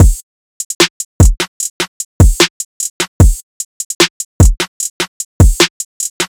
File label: Drum Loop 150 BPM, Trap; Alterations; Cymatics; ANALOG EVOLUTION Beta